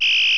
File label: LASER; 激光